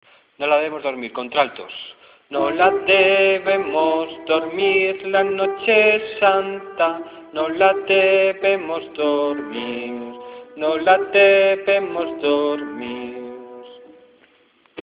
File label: altos